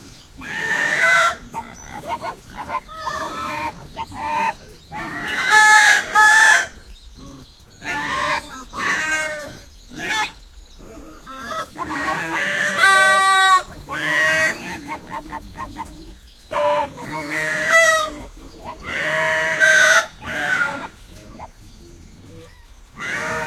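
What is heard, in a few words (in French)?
400 cochons crient en même; animals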